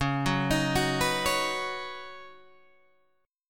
Db 7th Suspended 2nd Suspended 4th Db down Guitar Open G; JGuitar